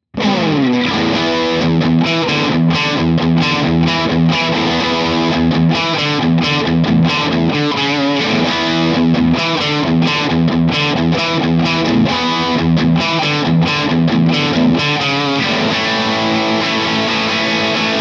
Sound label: characteristic sound; jensentone